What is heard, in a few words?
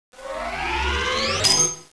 plasma charge, sound/weapons